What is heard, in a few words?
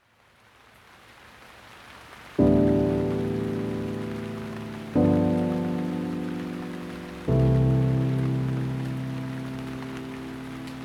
piano, beach chill with rain